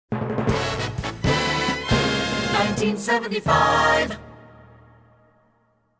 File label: Hits, Drops